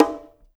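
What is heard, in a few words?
bongo; Spectrasonics; Liquid Grooves 1 & 2; CONGAS+BGOS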